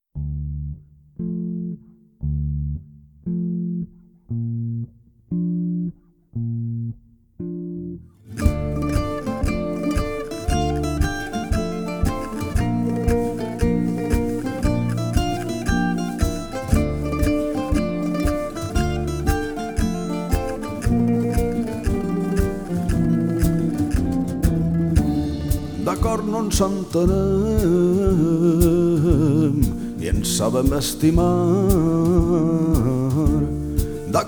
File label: Cafe Latino